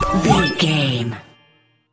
collect item

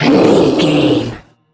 troll monster battle grunt

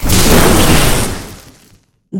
electric lightning blast

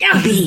voice male attack

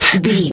voice male breath fast single

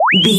retro powerup collect

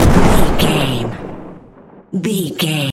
explosion med long tail

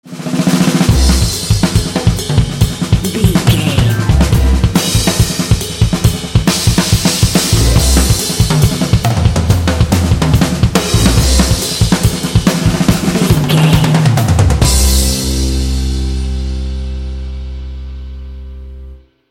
latino punch 15 sting

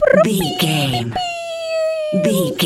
voice fun character vocal crazy

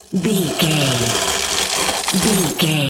ice spell freeze ground

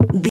footstep wood wobble

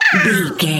goblin fairy hurt pain

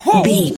voice male hurt pain

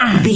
voice male hurt pain low

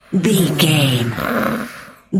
voice female growl hiss

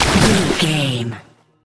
water splash object body

